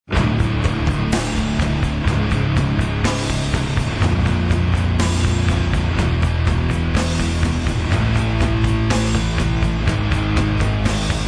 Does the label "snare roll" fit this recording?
No